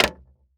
Foley Footstep Hard Sole Dress Shoe Jump On Wood Board Walk Quick; Foley Footsteps